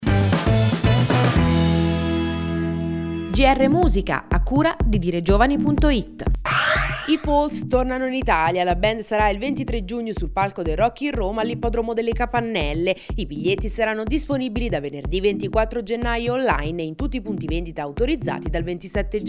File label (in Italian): musica